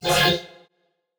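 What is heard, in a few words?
Color Bass F minor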